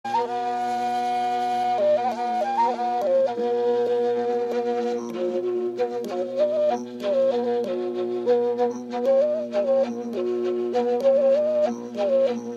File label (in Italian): bordone vocale; nari rajastan